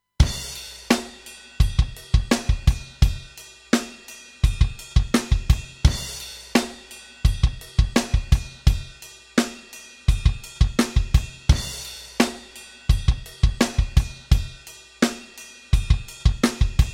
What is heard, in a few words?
Mastering Limiter V2 Drums Clipper; Bettermaker